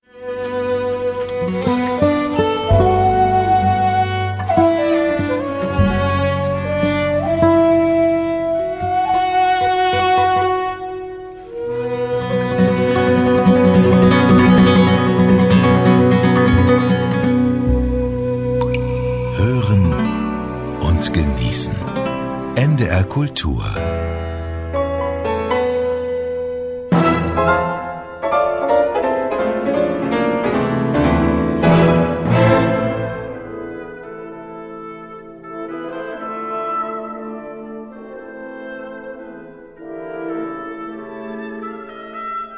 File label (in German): Transition Gitarre Klavier